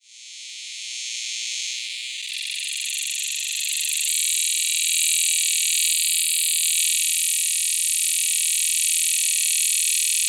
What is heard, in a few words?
Neotibicen similaris, cicadas songs; US; FL; filtered